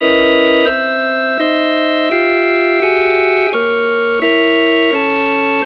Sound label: organ